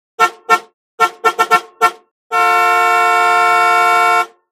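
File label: Car horn sound, klaxon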